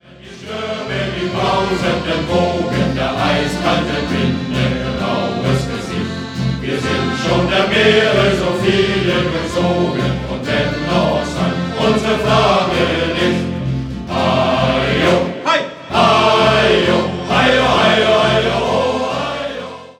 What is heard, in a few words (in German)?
Shanty Chor